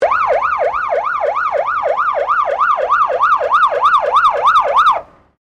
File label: SIREN FIRE DEPT COUNTRY AUSTRALIAN START STOP EXTERNAL STEREO, Australian emergency service sirens